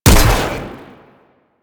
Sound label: Electro Mag Shot; Warframe; Corpus